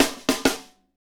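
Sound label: hip hop, Roland drum beats; Northstar - Drumscapes Roland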